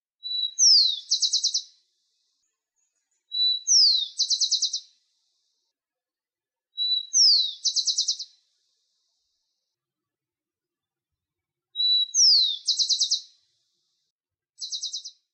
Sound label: Rufous Collared Sparrow